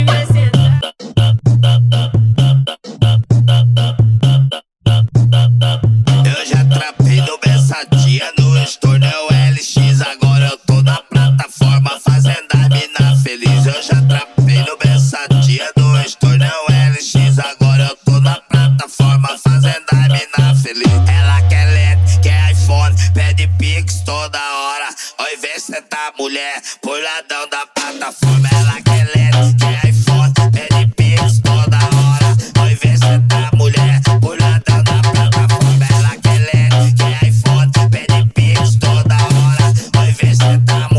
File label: funk bass